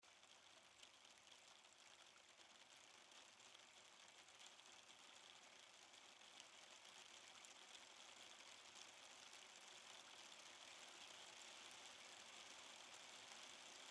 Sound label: Rain Loop